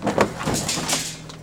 TRUCK JUN, Transportation, Truck Junk

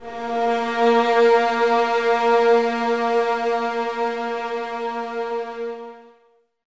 VS A#3, 11 Violins, Mute; Miroslav Vitous; String Ensembles

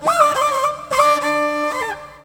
World Lick

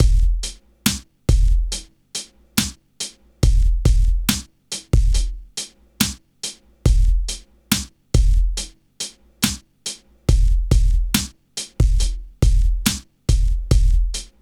HIPHOP, Funky; INIS; Funky Xtreams

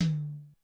Hi Tom, Korg DSS-1 Drums

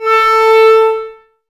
clarinets, Wood Winds

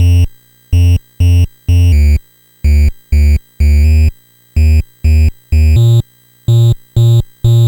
NES Bass, chiptune; Sonic Foundry (Sony Creative Software) - 8-Bit Weapon A Chiptune Odyssey; Nintendo Entertainment System

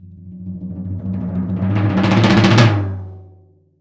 TOM, CTD TOM MLT

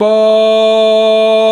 VOX, Male Scats

